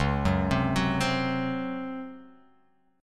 Db Major 7th Suspended 4th Db down; arpeggiated; JGuitar

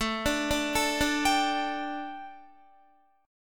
A 7th Suspended 4th, A, down, Guitar

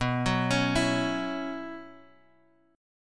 B Suspended 2nd Suspended 4th, Bass Standard

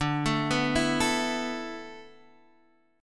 E 7th Suspended 4th

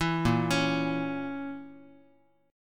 A sharp Diminished A sharp, Guitar; arpeggiated; 4A,4E,5A,5D,5G#,6C#; JGuitar

